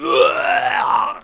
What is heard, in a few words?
male scream; npc; DeadFright